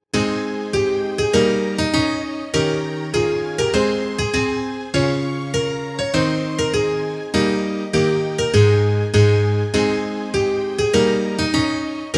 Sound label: instrumental